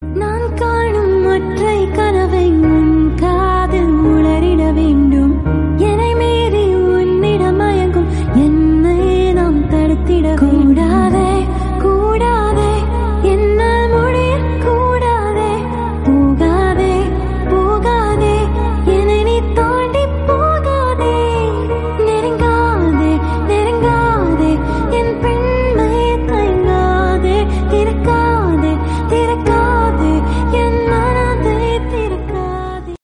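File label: female melody love tamil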